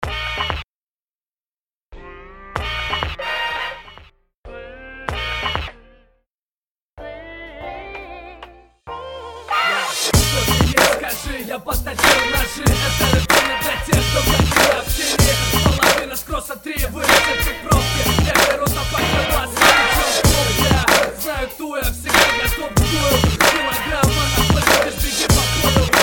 BEAT

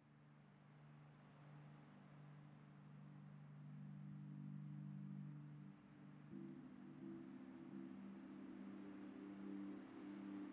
Pink Noise Binaural Beats

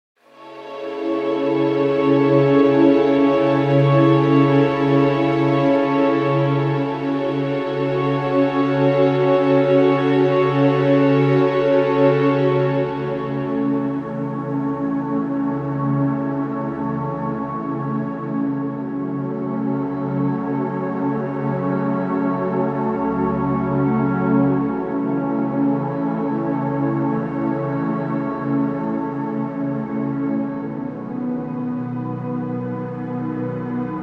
Relaxing Music

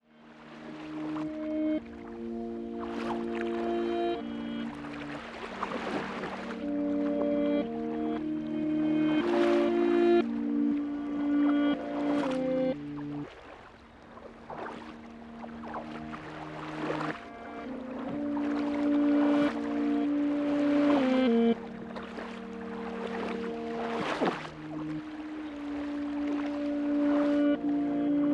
Sea Waves Sounds

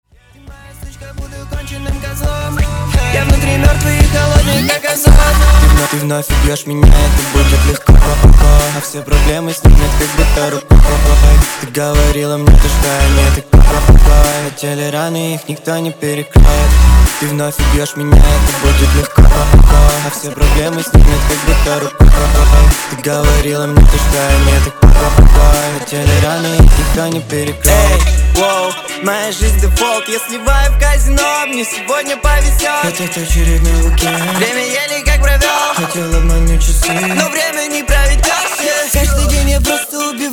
hyperpop hip-hop

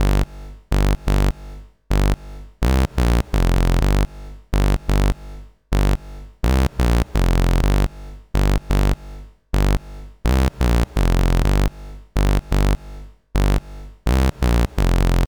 Bass

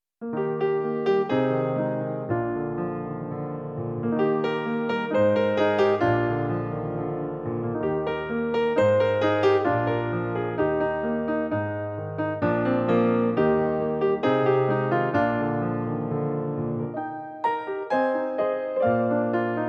Instrumental

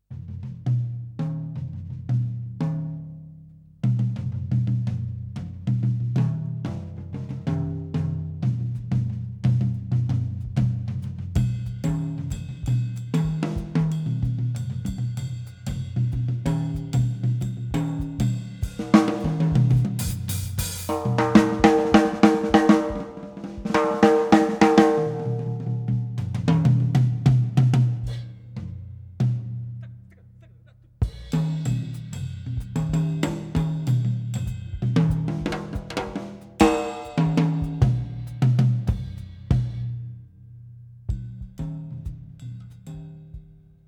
Drum solo

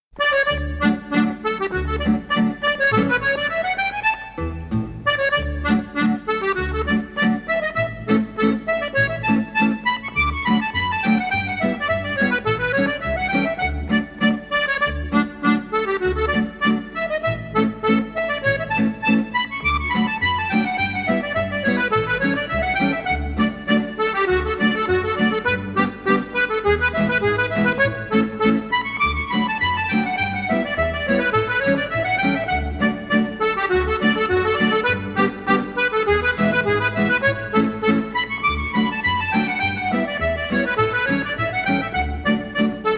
Sailor s Hornpipe, Quartet; Englischer Schiffsjungentanz

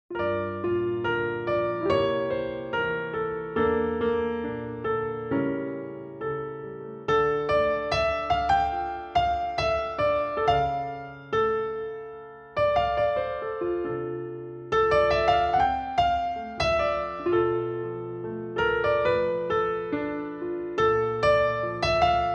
Jazz Trio

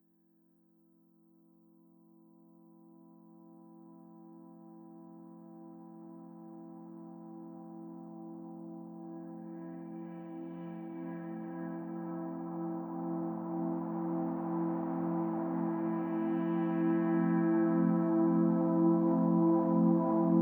Tibetan Singing Bowls, Resonance

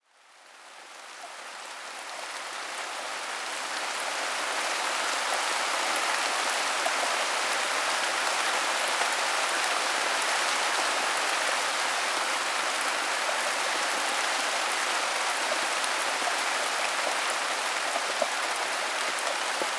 The Sound of Rain

